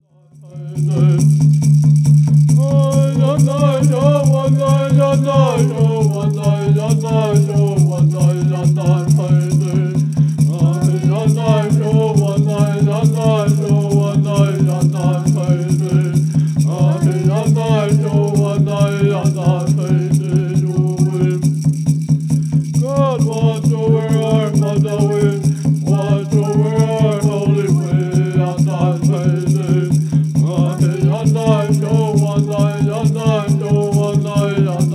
Peyote Healing Song

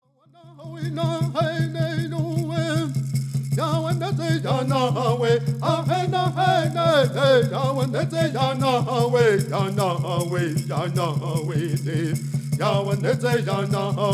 Prayer Song

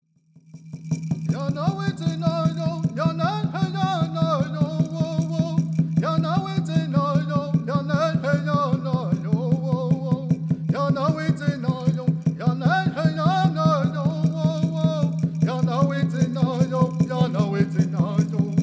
Peyote Song

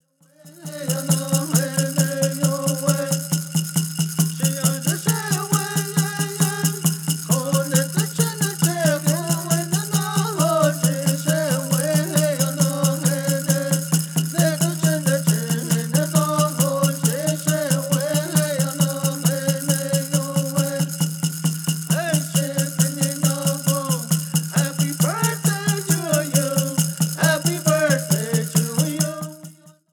Peyote Song

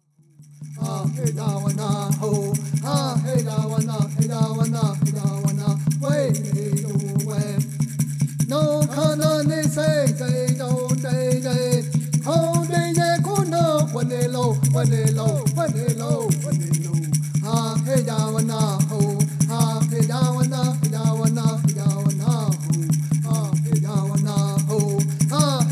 Peyote Song

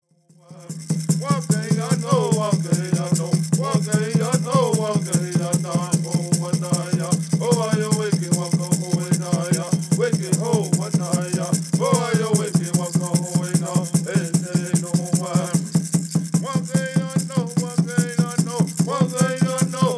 Peyote Song

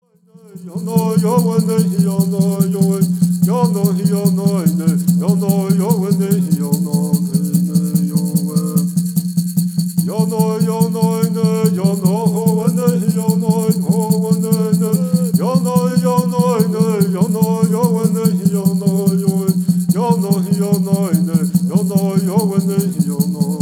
Straight Song

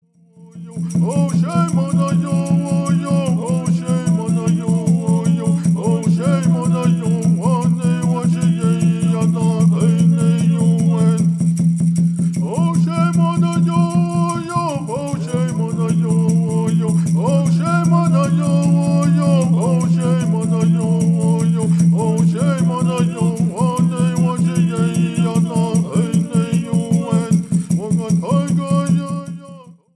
Peyote Song

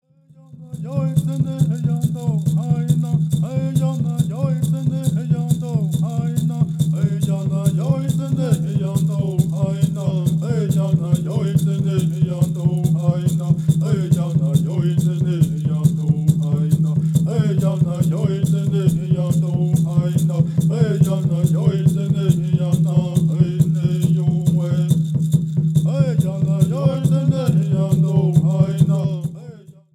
Peyote Song

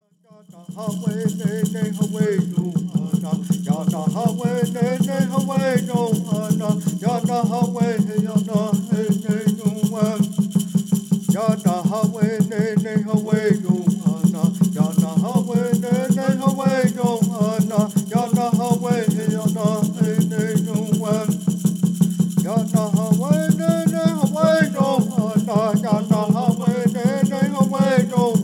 Ceremonial Song

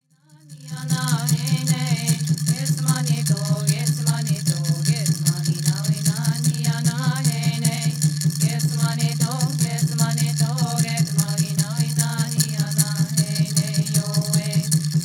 Native American Church Song

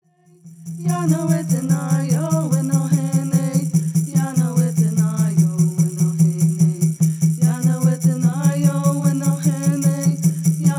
Peyote Song

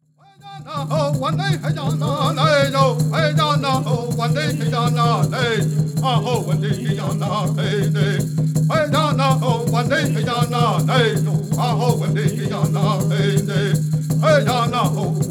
Intertribal Peyote Song

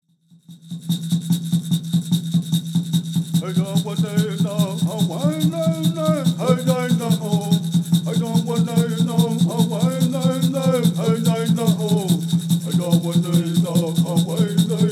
Peyote Song

